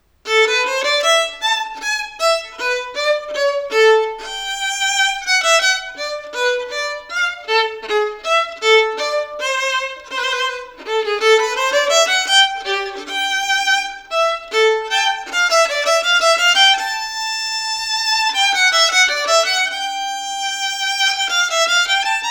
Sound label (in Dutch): dubbelconcert; Messiah